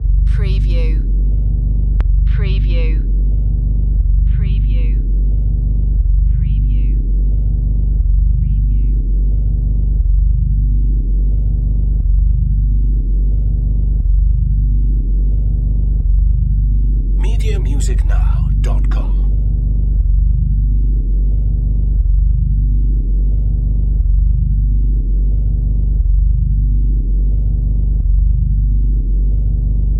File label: Morph Bass